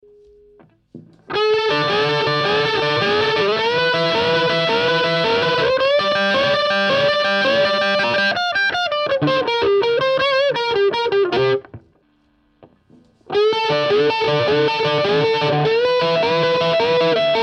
standard gain neck tone volume out

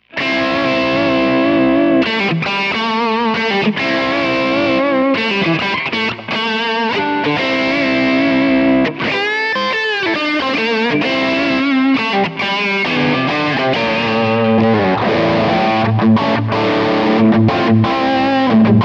Overdrive HiPass; Harley Benton Dual Effect Evil Twin

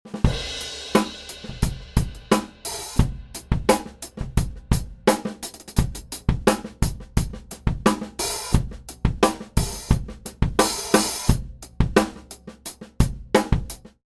Drums

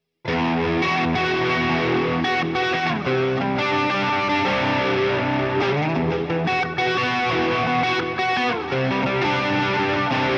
Amplitube4 Sonic Delay Crunch; irig Micro Amp

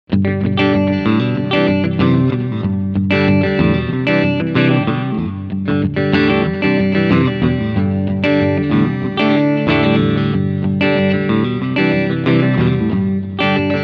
Clean Picking Front Humbuck

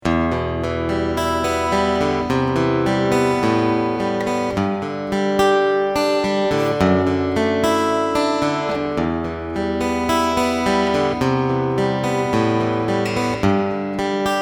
ENGL A101 Room

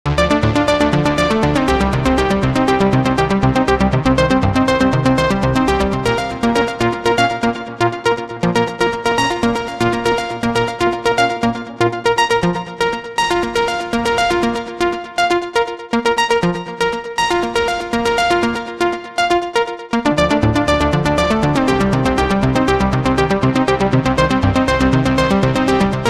DreamMachine Quant Arping FM

